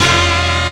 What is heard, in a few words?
HORNHIT, HORN STAB; E-Lab; X Static Goldmine 2; akai